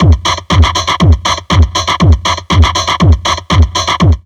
Drum Loops Crazy Processed

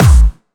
Distortion Kicks, Bassdrums

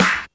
SD, dance drums hip

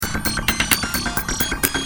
Sick kit, Drums Perc; Industrial

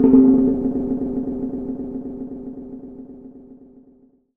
Timp Roll p; Roland M-OC1

